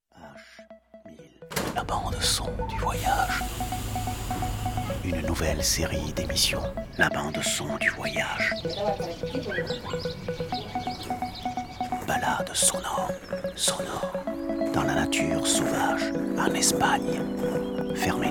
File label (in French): Espagne plein d oiseau et montage